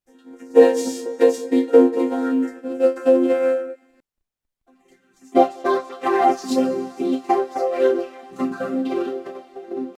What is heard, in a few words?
Vocoder